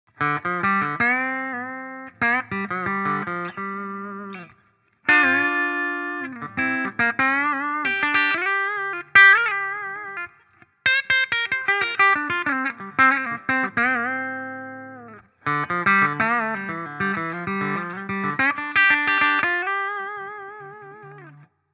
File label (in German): Gibson Les Paul Tribute Steg clean